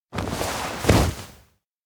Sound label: mount bike; anemoi